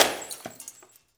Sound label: FX BOTTL, INDUSTRIAL; Roland - LA Composer vol. 1